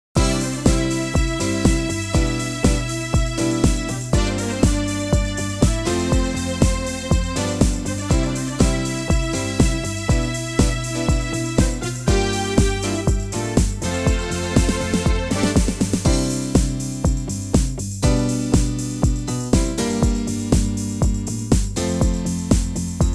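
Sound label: C live track, backing track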